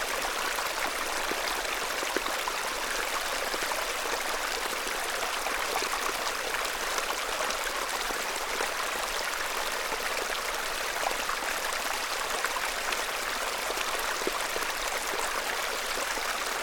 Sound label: Water Stream Small Calm Close; Soundreorganized; Everything So Far